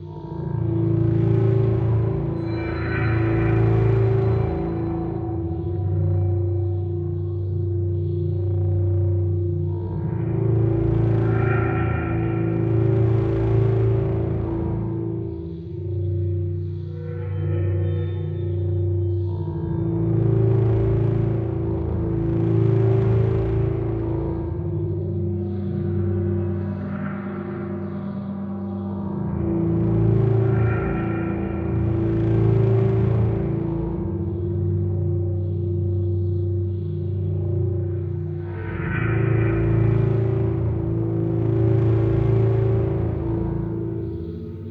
Distorted Ambience Detailed Loop; Wizard Hunter The End of the Magic World

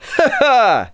Emote Cheer; The Princess and the Frog; wii windows; Twitch Skijump

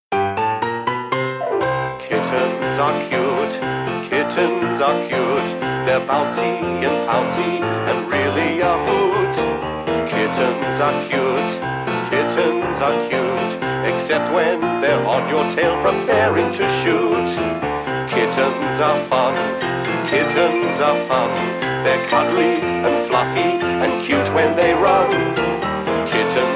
Song Kittens Vocal; baron fur is gonna fly

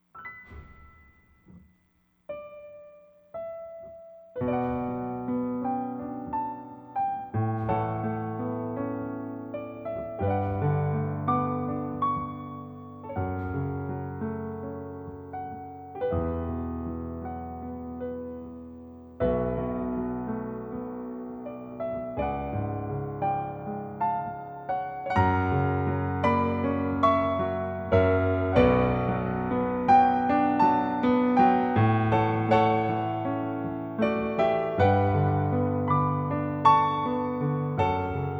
overture - Waterfall

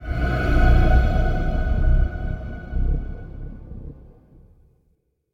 End Combat Sting; Void Bastards

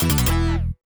Start SFX; WWF WrestleMania: The Arcade Game; Genesis

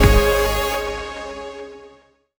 Boss Stop; Summer Catchers

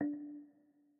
Move D 3 Mp

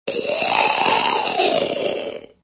Zombie Choke; Extra Lives; Android

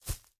Footsteps Leaf; Main Character

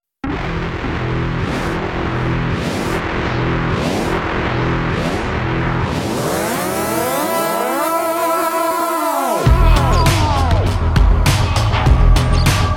Evil Beat; Pixel Gun 3D; Sniper Forts

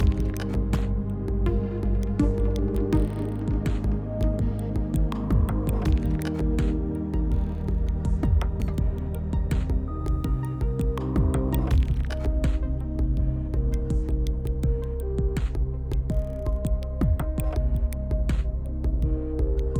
Vive Puzzle 8CH 82BPM

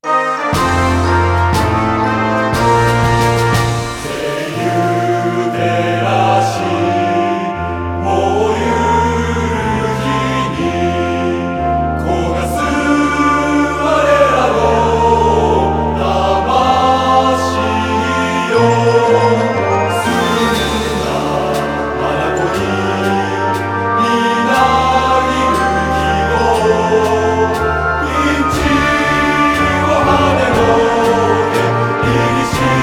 Music Menu event

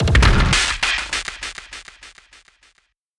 Small Fight End; sky break

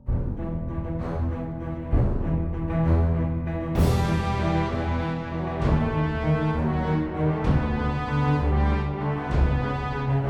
evento tension battle boss loop; noahmund

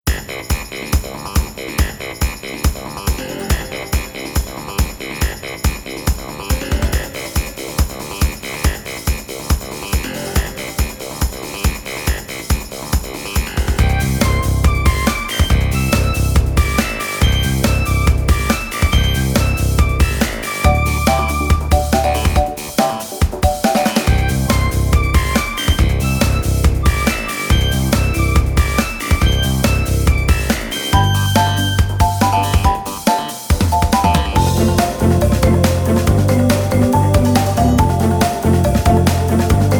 Warp Room; N. Sane

